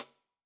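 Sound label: Sab Closed Hat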